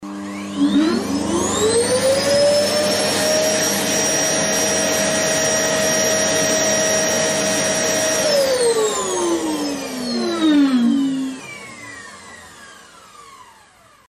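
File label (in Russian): пылесос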